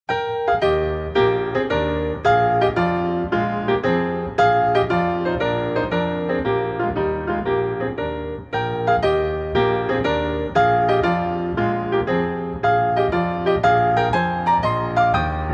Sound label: group Piano